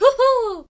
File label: hoohoo; peach; char-select-extra-chars; sm64coopdx